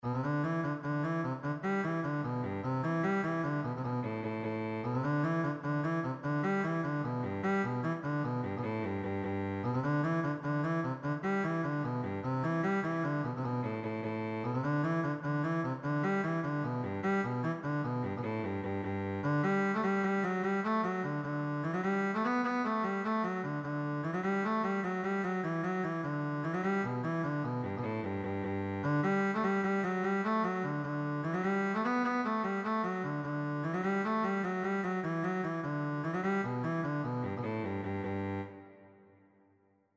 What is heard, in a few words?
cello